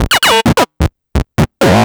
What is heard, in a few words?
Drums Strange